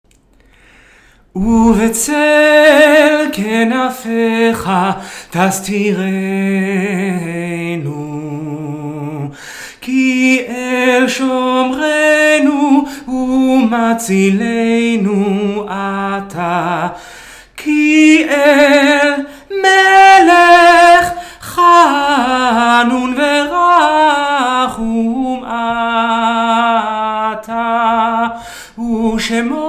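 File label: Uv'tzel soprano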